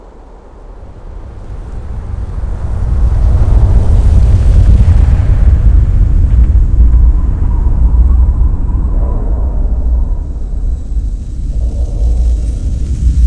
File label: amb; polarcomplex